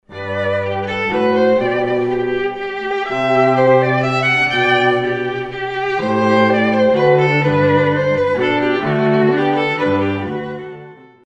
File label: bach minuet in g; 1725